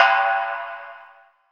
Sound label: prc G, Ambi Perc, Chillout; Best Service ProSamples vol.36 - Chillout